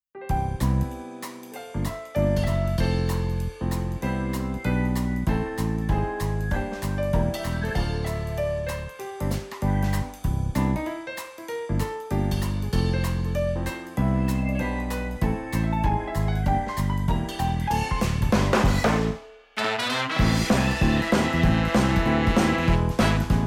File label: piano meets brass